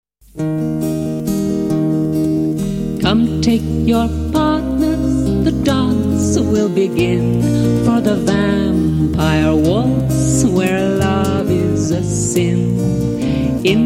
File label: Vampire Waltz